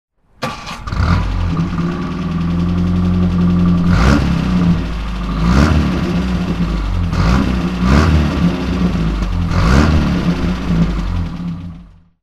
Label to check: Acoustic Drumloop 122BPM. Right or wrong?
Wrong